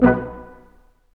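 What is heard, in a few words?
BRS Q.SHT, Roland, brass staccatos, quintet; Roland L-CD702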